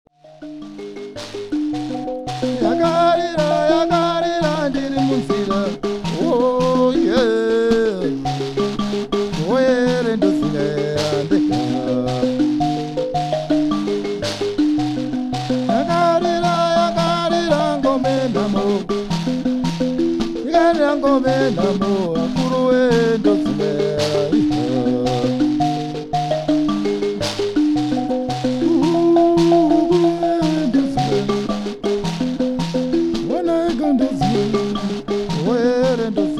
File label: mbira